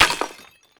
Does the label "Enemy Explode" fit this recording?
Yes